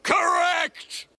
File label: correct button; plankton